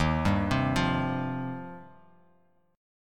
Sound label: D sharp 6th Add 9th D sharp Bass Standard; arpeggiated; JGuitar